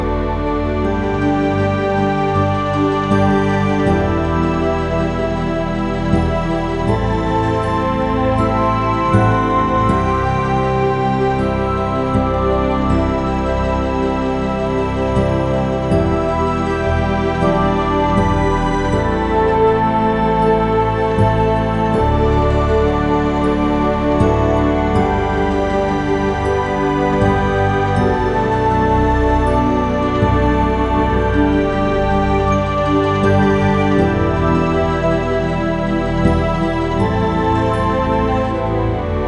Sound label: Nordic; rpg